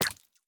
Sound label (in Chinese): 蝌蚪受伤声